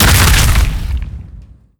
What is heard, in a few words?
body impact hard, antlion; creatures